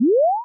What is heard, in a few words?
power on